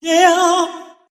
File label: "yeah", sung